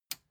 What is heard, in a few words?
metal button tiny push disable